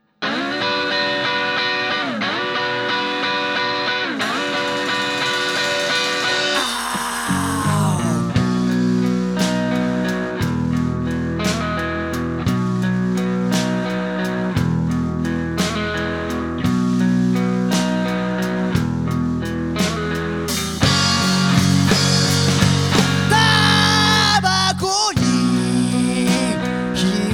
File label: melody